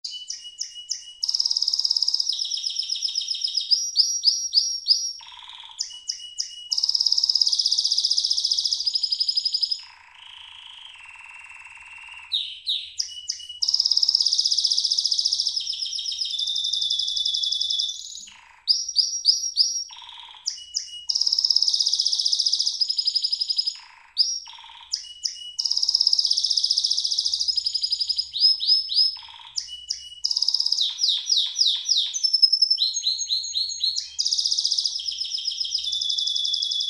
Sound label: Canary, Bird Songs